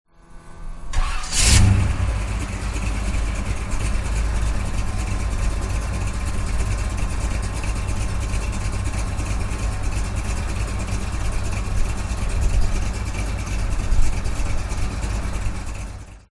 VINTAGE CAR Chevrolet 57 Start Engine Exterior; TRANSPORTATION

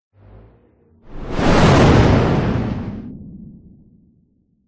science fiction whoosh warping heavy fast; zapsplat

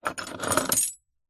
handcuffs metal heavy pick up from wood surface

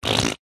human wet short bold fart

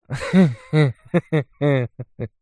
human male middle aged laugh chuckle short; zapsplat

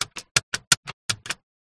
phone booth dialing; the sound pack tree

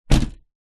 vehicles truck door close

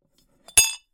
bell school handheld tip side ringer clunk muted

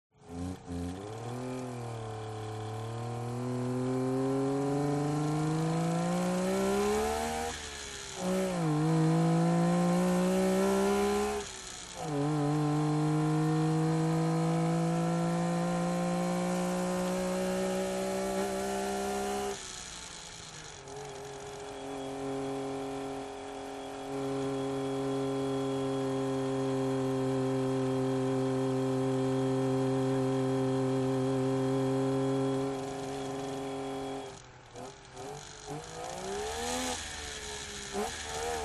TRANSPORTATION CAR Saab 92 Drive Engine

